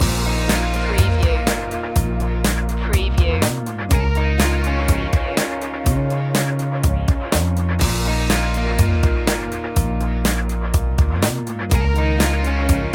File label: loop, chill